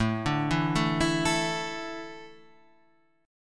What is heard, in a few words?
A Suspended 4th; Asus4 Chord {5 5 2 2 5 5} arpeggiated